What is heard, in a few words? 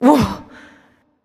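confused, female; smite; voicepack; hera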